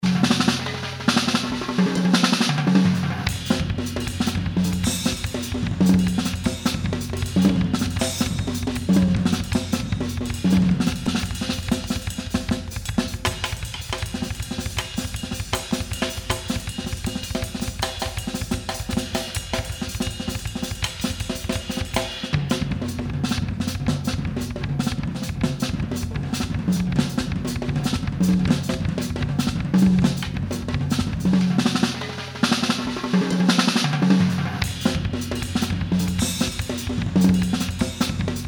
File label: Fast Samba